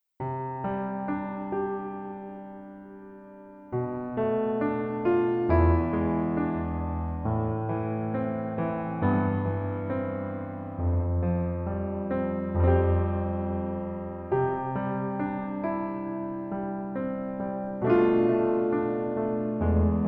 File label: Piano ver